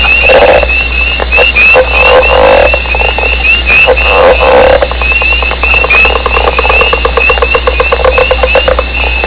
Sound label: northern leopard frog sound; amphibians; frogs-toads; animals